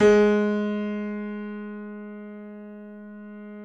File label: KEY G#2, YC7 Piano mf; Roland - Rhythm Section